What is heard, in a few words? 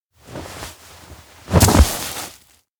fall mountain; anemoi